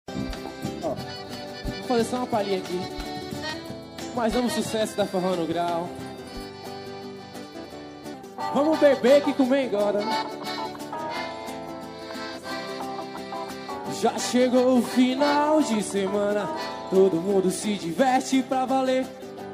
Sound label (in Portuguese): forró ao vivo